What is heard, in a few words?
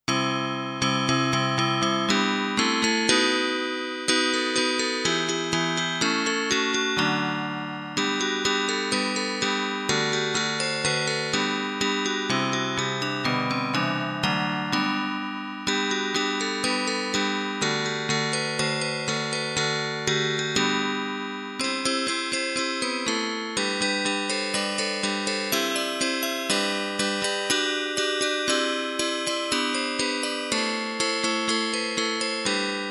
Bells, Unclassified Electronic; Edgar's Bells - Movement 2 Golden